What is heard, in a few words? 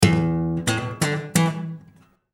riff